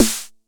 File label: Snare 909; gate experiments